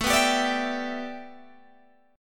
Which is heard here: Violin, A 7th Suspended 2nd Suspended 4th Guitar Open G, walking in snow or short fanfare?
A 7th Suspended 2nd Suspended 4th Guitar Open G